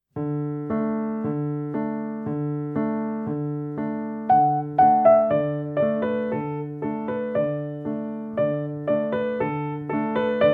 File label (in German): chinesisches lied